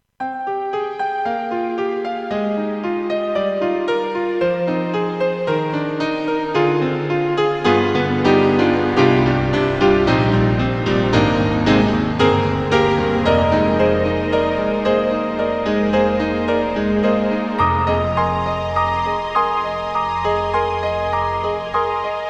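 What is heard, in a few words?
Piano Strings, Orchestral; Roland; SRX-06; SR-JV80-16; XV-5050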